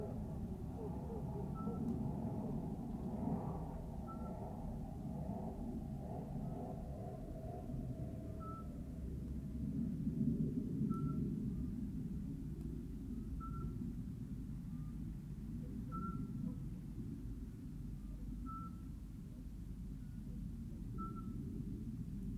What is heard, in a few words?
Night Ambiance